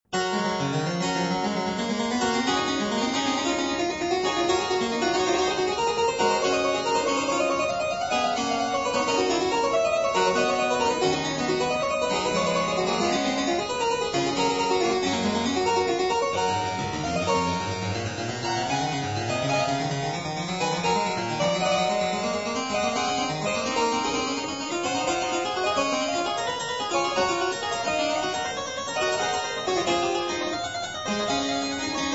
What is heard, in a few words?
Variatio 26 a 2 Clav.